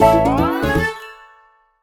Cookie Collected; SpongeBob Krusty Cook-Off; android

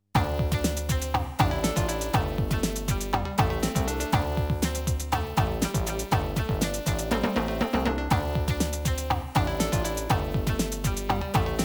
ENDING NORMAL; sengoku ace

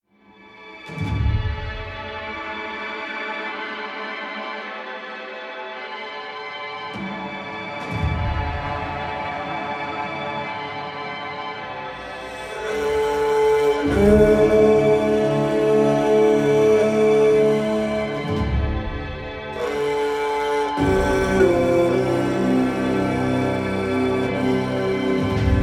Highlands Ambient; song of iron

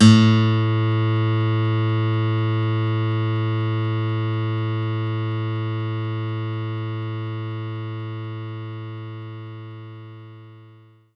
Steel Guitar A3

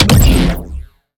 Shot Medium Laser Photon Pulsar

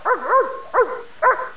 Dog; Buggy; PS1

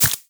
Swamp Jumper Hit By Arrow

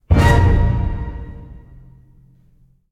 Stingers Stabs; gamerip

